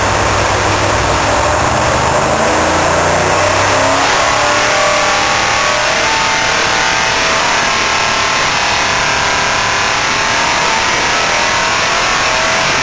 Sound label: mufflers